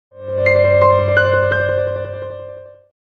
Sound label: chime ringtone; wandavision